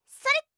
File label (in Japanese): 攻撃